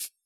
cabasa; AnlgKit1

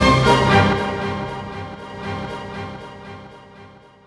ORCHFX, orchestra, Asian Traditions; USB Soundscan vol.60; AKAI

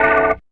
Bell; Sonic Foundry (Sony Creative Software); Esoterik Beatz; Saver FX

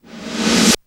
REV SNARE; Back In Time Records; Big Bang; SNARES

